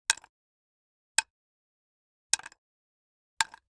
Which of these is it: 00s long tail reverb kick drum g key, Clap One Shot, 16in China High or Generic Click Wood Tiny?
Generic Click Wood Tiny